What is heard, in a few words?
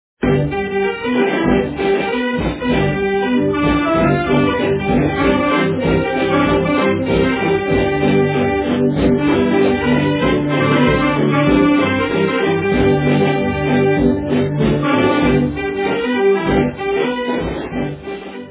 rock clock